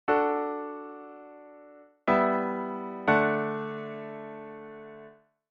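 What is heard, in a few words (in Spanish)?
Cadencia Plagal Ii a I, D; auditivo de cadencias autenticas plagales rotas y semicadencias; Identifica la cadencia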